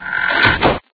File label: door close; EDGE